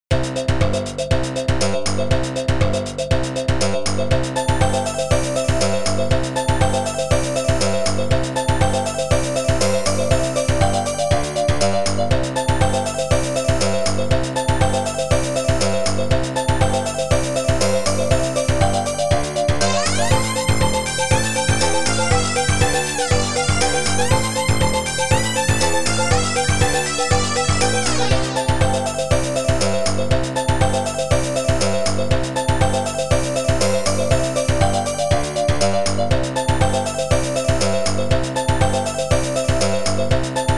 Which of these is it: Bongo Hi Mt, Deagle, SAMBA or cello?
SAMBA